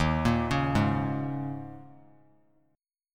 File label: Eb 7th Suspended 4th; Eb7sus4; arpeggiated; JGuitar